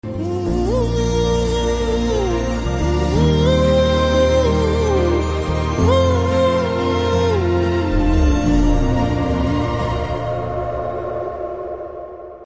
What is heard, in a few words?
Hindi BGM; Radhe Shyam